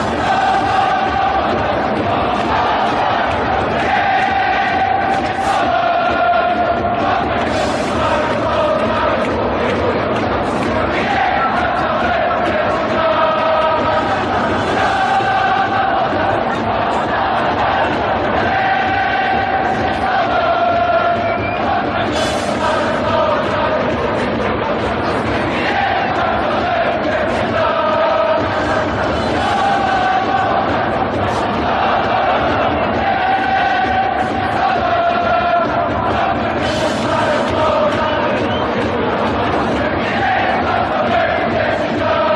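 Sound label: yeongweonhan seungja, football chant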